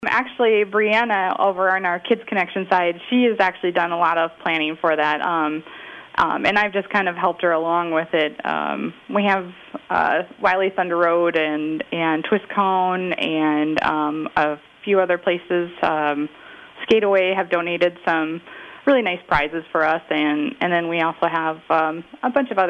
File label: Carnival; Kids Konnection